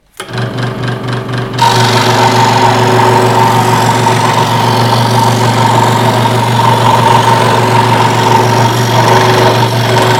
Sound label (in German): Stichsäge